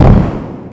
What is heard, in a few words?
Explode; Captain Bumper 0.58